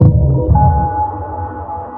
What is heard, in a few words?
sonar Ping Air Close Shuttle Directional; Barotrauma; Real Sonar